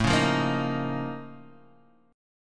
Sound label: A sharp Minor Major 11th, A